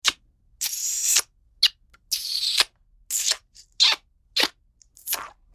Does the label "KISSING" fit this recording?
Yes